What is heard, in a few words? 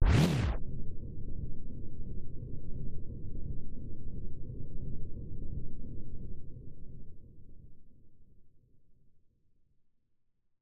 engine; super mario excitebike